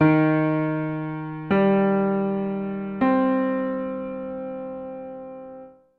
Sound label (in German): moll arp